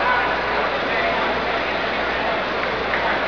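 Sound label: stock exchange; 12000 Graphics Pack - A Complete Graphics Collection For Your PC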